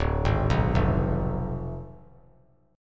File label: D Diminished 7th D down; arpeggiated